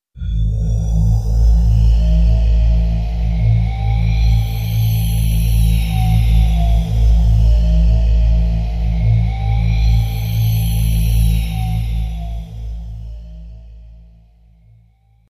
Motion Syn

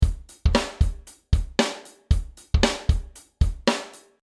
Funky Pop 115

